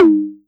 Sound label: Tom DMX Analog; Drums